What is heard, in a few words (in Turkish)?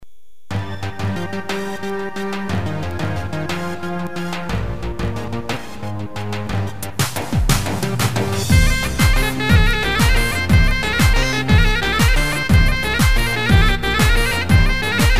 tekno halay 6 8